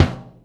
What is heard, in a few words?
bass drum R, Drum & Bass 180 BPM; Ueberschall; Drum & Bass Flash Trackz